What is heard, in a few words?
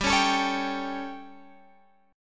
G Suspended 2nd Flat 5th G Guitar Open G